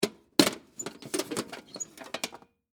Lunch Box Open, Foley, Lunch, Box, Lid, Open, Metal, Case; Lids; Objects; BlastWave